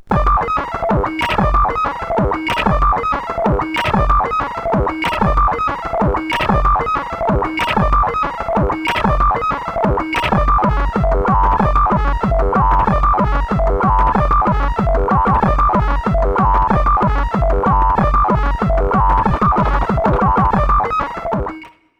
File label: 22 seconds of hard techno; syntax17